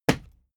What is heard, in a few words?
fight impact sweetener cloth; nahkampf; soundpool